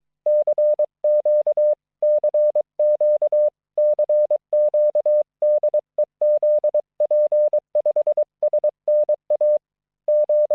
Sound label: CW